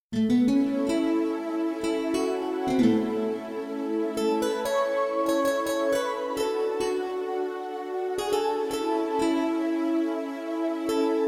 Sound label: waltz